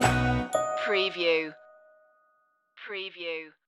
happy clappin stinger

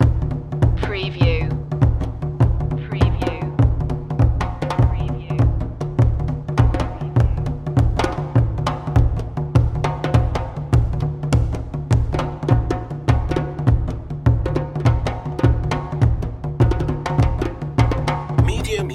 african drums ikibwenga nyakyusa fusion loop

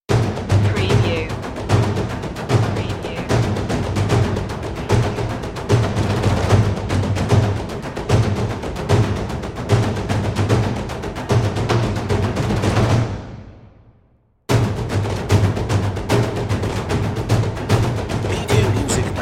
epic percussion taikos only

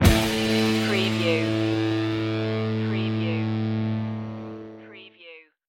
punk pop stinger

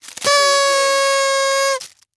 Party Favor Horn Blow

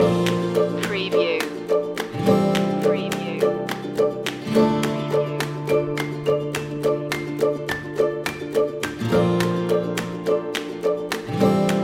clean path loop

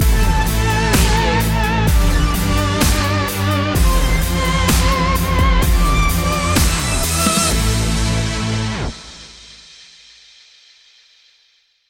dubstep stinger

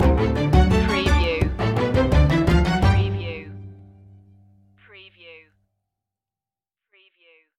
hip hop bach prelude stinger

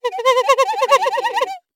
Cartoon Clarinet Squeaky

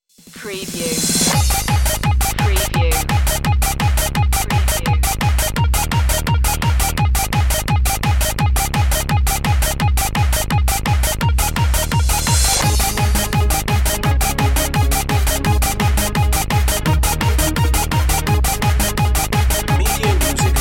bubble gum beats

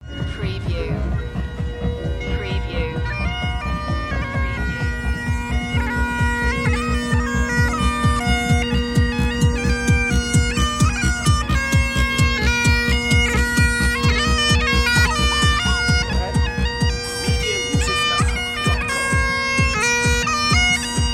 Crazy Bag Pipe Parade

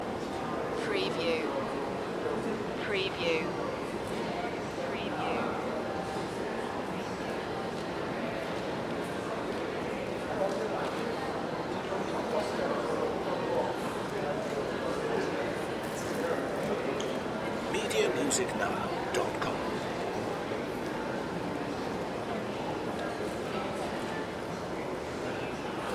Crowd, Hotel Lobby Ambience

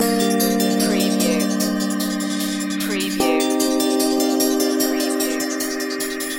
xpress loop

